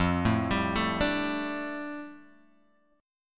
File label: F 6th Add 9th F down Guitar Open G, chord